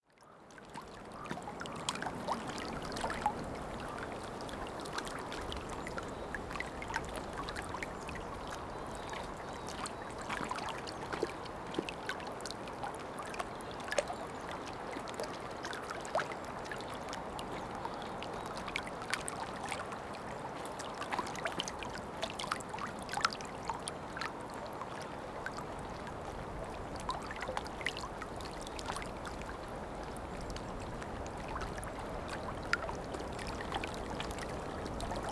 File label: Flowing Water, alarms